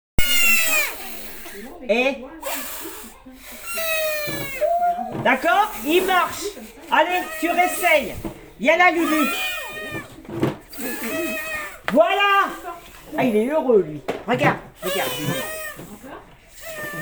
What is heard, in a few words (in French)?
voix avec les branches